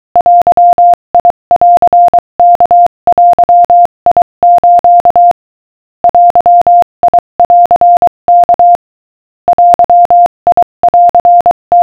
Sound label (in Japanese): denwa denwa